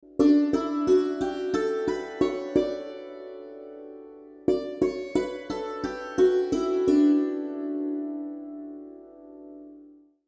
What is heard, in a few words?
Bilawal; murchanas